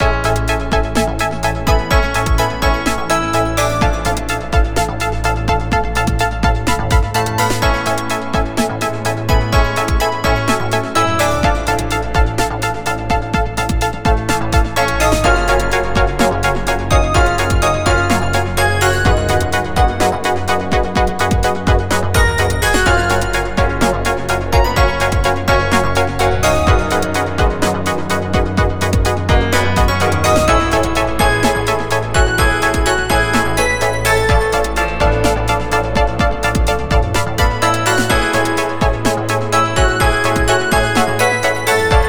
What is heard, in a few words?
Brisk Alarm, phonetones; Sony Ericsson; W508; PlusFourSix; Brittany; Lars Edvall